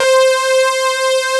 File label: SUPERSAW, JV 1080; Pro-Rec - Synthsonic